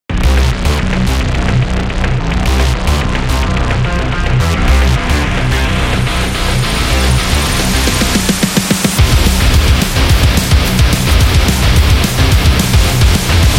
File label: High Energy Metal